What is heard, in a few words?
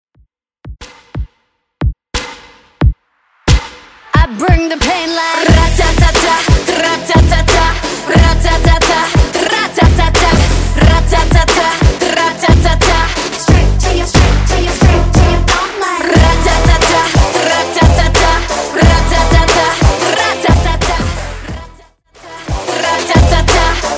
KPop Quick Redrum